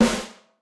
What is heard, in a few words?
snare Start; willyshotchillies